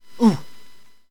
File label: Pain